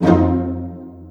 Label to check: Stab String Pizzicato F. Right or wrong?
Right